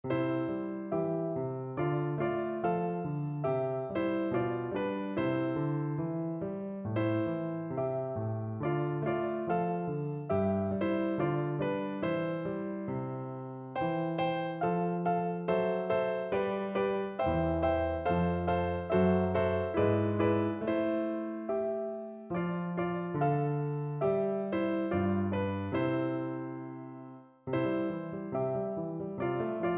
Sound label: piano; O chyr preblahy